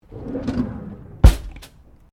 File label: Drawer Interior Wooden Kitchen Large Close; Various domestic drawers; Household